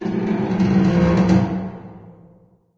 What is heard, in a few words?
metal randoms, ambience; source engine; portal2